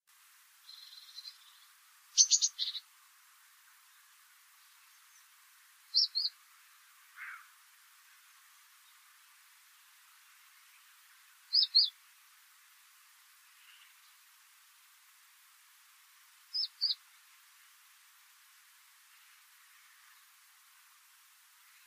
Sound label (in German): Kohlmeise; Wyhlen